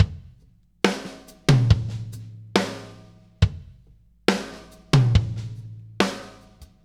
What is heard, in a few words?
Loop, Drum Tools, Laid Back; Sonic Foundry (Sony Creative Software); Producer Dan